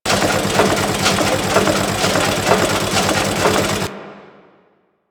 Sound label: Village Machine Loop; Warframe; Ambience; Eidolon Plains; Ostron Village